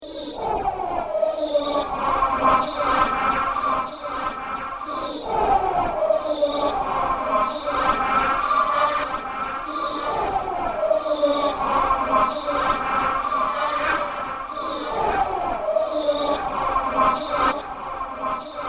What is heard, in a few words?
emergency